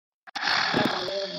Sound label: low growl note